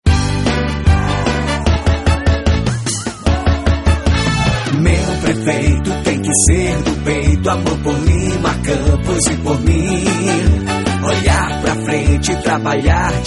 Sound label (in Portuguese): Jingle politico